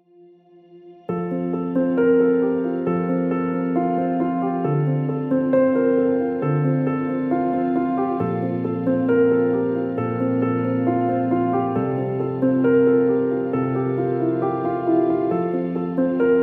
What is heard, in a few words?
bgm